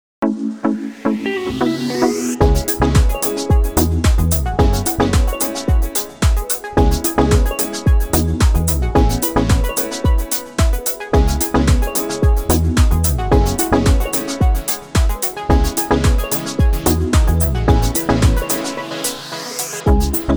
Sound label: BALEARIC JAZZALUDE